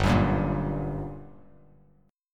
G Minor Major 7th G down Bass Standard, GmM7 chord; strummed; JGuitar